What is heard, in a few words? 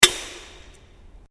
Can Aluminium Inside Crack; various metal cans; Cracking; Objects; SoundEffects-Foundation1